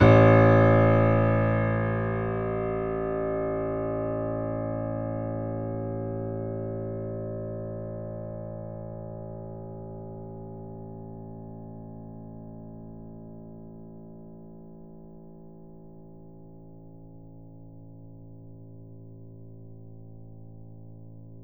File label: Grand Piano A0 f